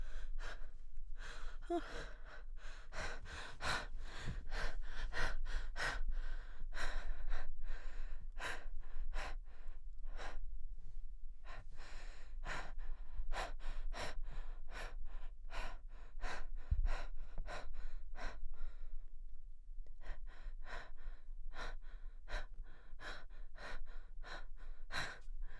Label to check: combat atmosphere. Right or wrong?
Wrong